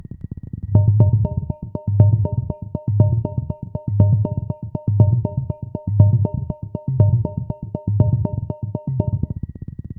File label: pulsating volumetric flas, drum